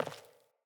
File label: Sandals Walk Default; Player; Footsteps